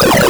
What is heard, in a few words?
HEAL; Shadow Knights